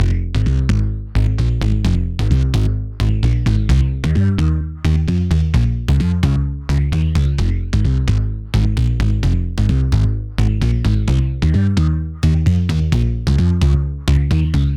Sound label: Phat BassLine Fm 130 Bpm; Axel Wayn